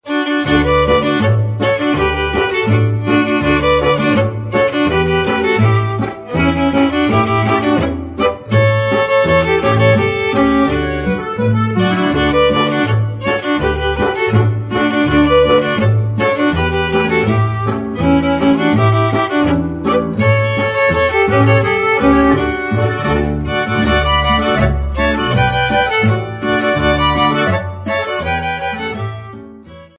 boarischer

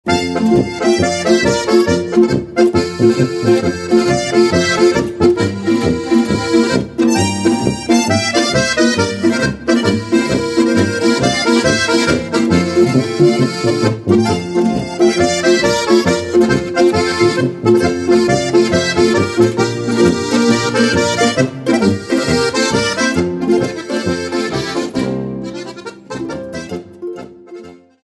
polka; tourngeherpolka

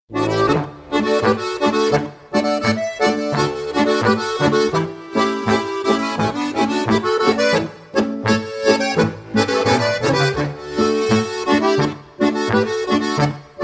boarischer

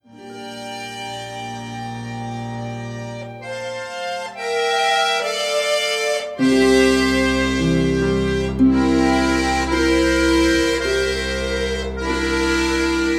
filmmusik

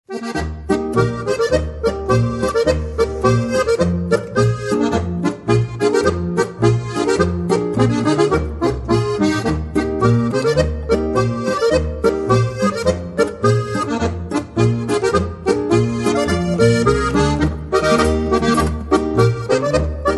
polka

